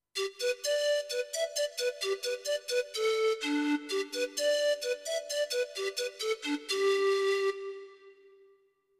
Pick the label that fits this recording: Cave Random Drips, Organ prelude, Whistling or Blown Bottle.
Blown Bottle